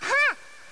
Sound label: throw, hegrenade; weapons; kirby; CS ForKids